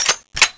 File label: clip in; cso